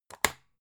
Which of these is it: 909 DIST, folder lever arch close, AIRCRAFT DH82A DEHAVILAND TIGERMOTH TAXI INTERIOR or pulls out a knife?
folder lever arch close